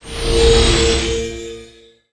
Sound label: Divine Shield; Gamigo